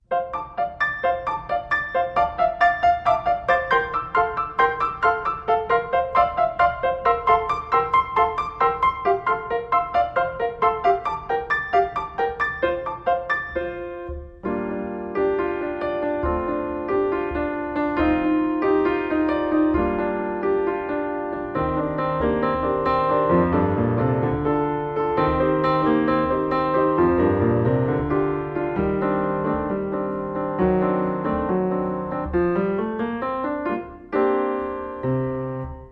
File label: Playful Smile